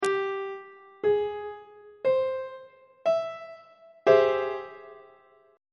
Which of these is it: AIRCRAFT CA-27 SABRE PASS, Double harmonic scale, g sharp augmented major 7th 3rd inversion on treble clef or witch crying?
g sharp augmented major 7th 3rd inversion on treble clef